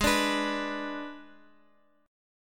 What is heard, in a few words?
Ab Diminished Ab Guitar Open F; Abdim strummed; JGuitar